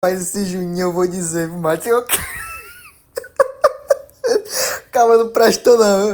rindo

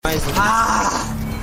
ahh raiva

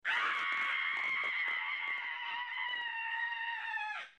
grito

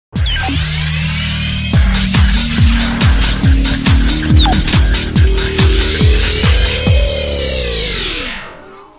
vinheta de abertura

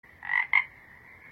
sapo barulho

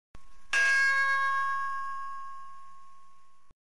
sons de fundo som de pancada; chapolin

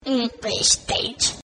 risada

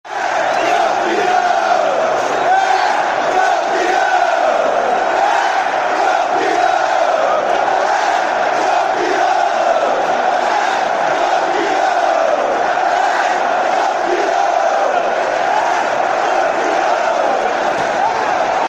campeão torcida

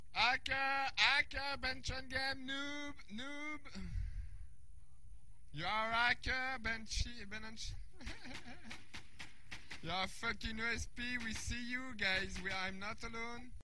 chorando

